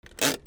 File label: CARS